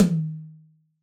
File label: Yamaha DJX 2B, Rock Tom